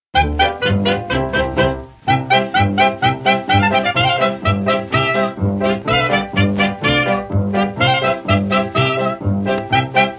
Polka; Pologne

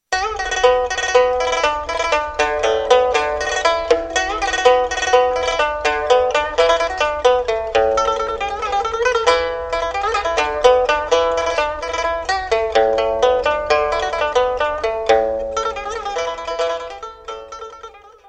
pipa; instruments

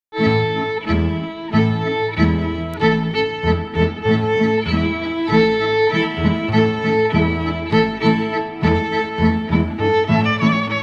Kolo; Serbie

